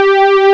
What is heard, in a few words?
JUNO LO STR; Vintage